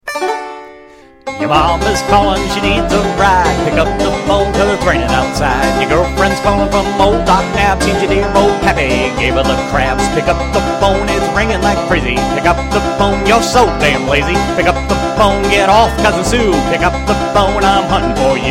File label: redneck ringtone